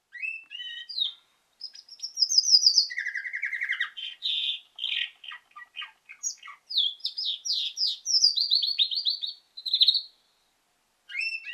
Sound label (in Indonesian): suara kenari betina